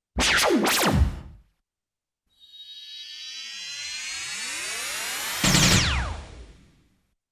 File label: POWERSHOT